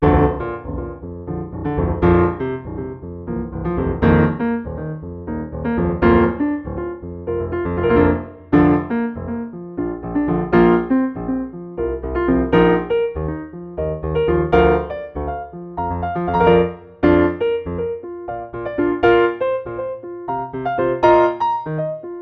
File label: Grand Piano; PianoV